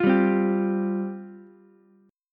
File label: F Suspended 2nd F Ukulele; strummed; JGuitar